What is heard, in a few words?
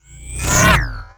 wooshes transform sweetener